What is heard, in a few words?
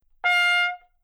trumpet note